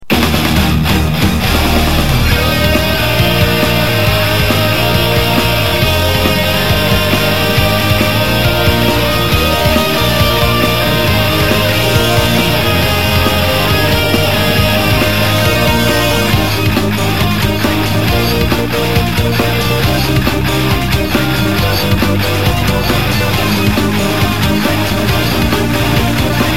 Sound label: Power Up; Advance Wars Days of Ruin